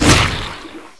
launcher fire, weapons

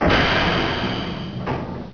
door move

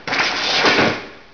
zap, debris

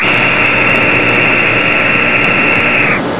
turret search

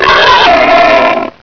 bullchicken attack growl

